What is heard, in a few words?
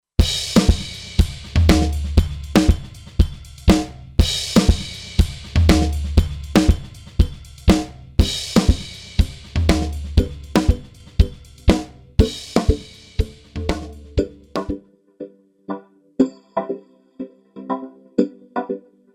Vocoder Drums; Presonus Studio One 6